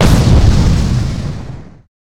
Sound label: explosion plasma; ufoai; weapons